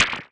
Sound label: Wash, One Shots Hit; Sonic Foundry (Sony Creative Software) - Future Retro Dance Excursions